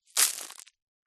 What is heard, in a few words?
foley dirt very small stones debris handful drop ground; zapsplat